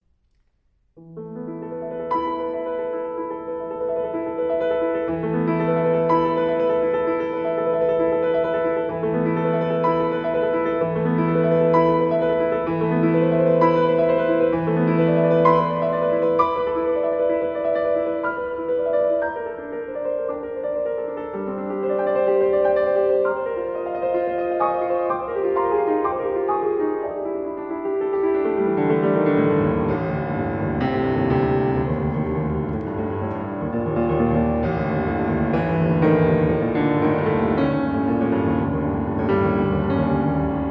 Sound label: Prelude